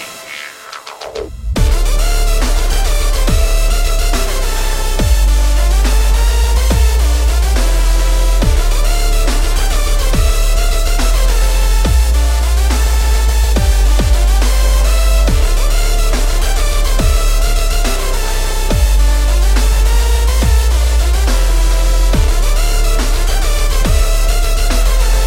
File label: Dubstep Mix